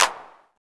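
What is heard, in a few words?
percussion 909 clap; Roland L-CD701; Clap & Snap; Clap Modules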